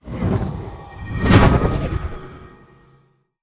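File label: magic conjuration charge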